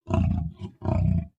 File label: Pets Lion Sleep; creatures; Lego